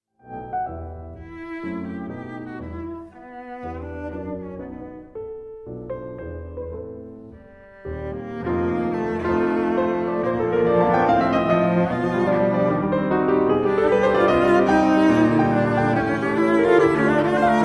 sonata in g minor op 65 for cello and piano 4th movement finale allegro; frdric chopin